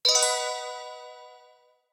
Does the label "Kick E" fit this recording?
No